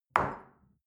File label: shell place on table medium; soundpool